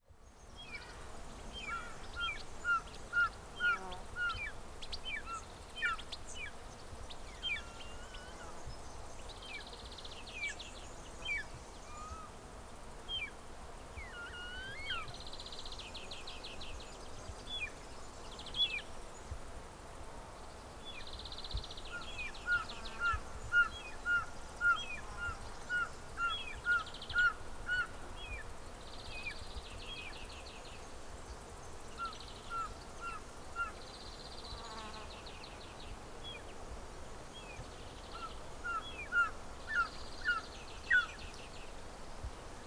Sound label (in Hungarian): kígyászölyv hangja